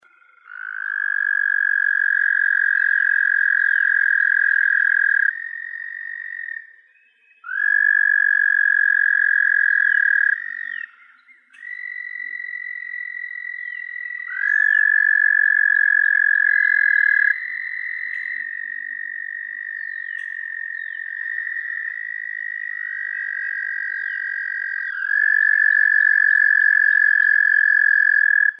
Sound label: American Toad; Wildlife